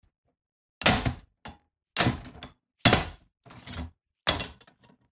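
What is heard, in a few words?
metallic mats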